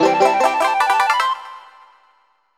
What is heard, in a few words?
Collect Extra Honeycomb; Banjo Kazooie